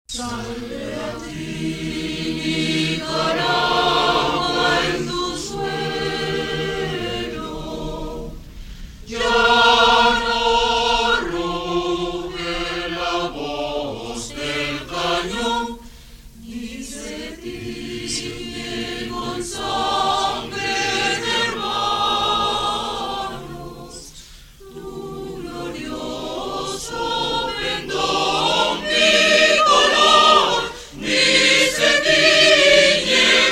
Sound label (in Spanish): Himno Nacional Nicaragua Coro Nacional